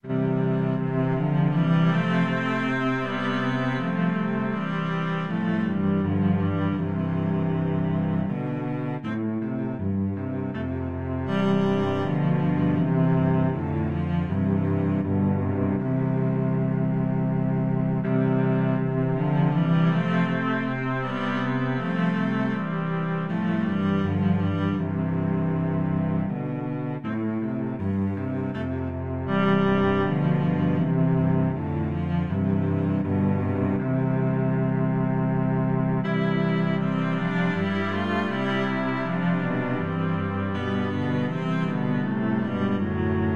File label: cello quartet